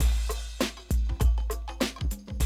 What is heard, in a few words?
drum loop, 100 BPM